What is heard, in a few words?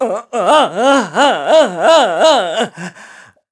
Shaken; Link shaken by an overwhelmed Groose; Skyward Sword; zelda